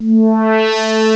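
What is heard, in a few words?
wah synth, analog; M8; CMI Factory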